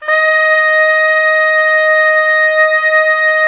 SOPRANO SA-L, Sax; Giga Samples Collection; SAX STAX

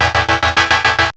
guitar repeat; GUITARS; M8; CMI Factory

